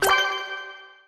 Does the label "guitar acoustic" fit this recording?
No